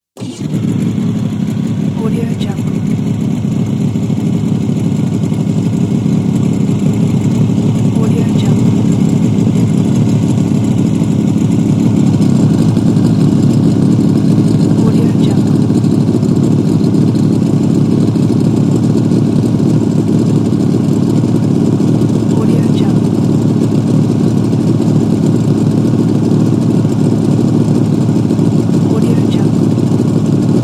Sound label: boat start idle stop